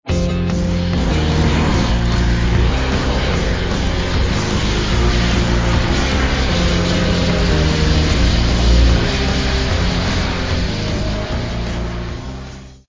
Hover Pack Engines Failing Losing Altitude Dramatic Flourishes